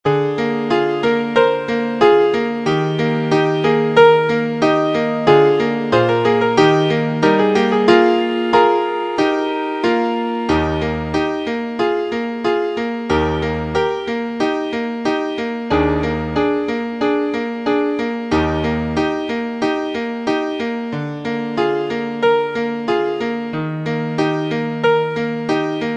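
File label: accompaniments, Trumpet in Eb; Vocalise #3; concone; Rudimentary Transposition Studies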